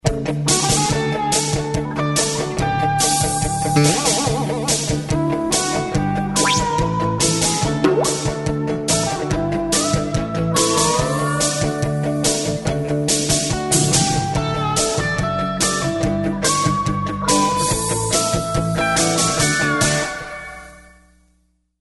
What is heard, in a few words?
HAWAIAN; FRUIT LOOPS